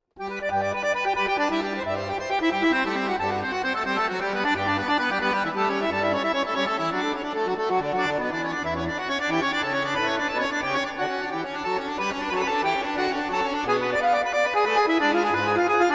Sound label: Prelude in G major